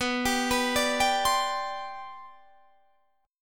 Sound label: C Major 7th Suspended 2nd B, chord